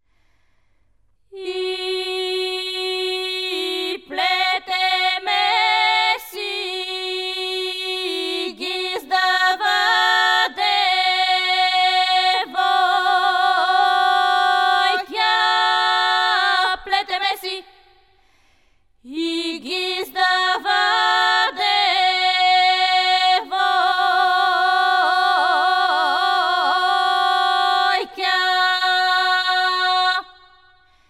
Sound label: Shopski airs